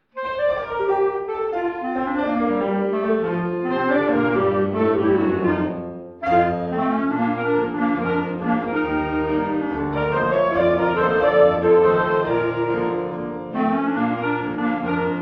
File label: Entertainer Trio